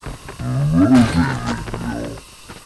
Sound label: STONEBLOCK PEACE IDLE